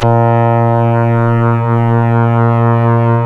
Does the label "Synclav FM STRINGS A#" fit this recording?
Yes